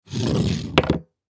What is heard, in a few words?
Thermos Empty Slide Knock Over Wood; Household; Slides; Objects; Blastwave